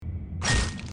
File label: hit; dandy's world; roblox